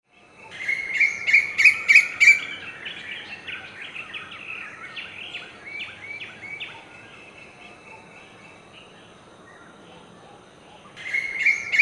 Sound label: Asian Koel female call